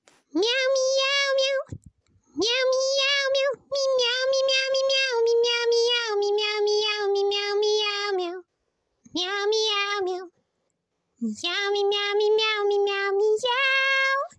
meow; espresso machiatto kawaii

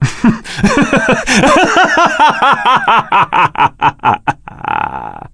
blood elf male laugh

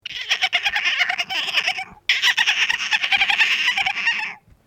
dolphin

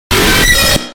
jumpscare; ambush doors

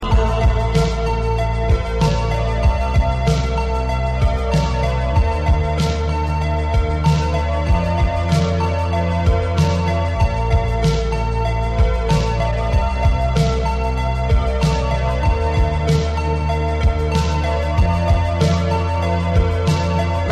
inspirational synth sample

